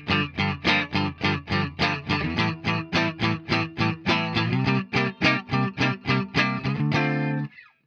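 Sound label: jazzmaster clean; fender classic player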